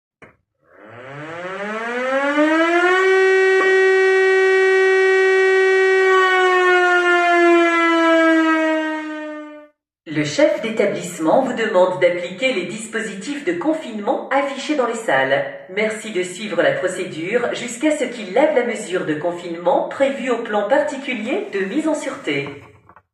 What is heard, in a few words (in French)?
Alerte confinement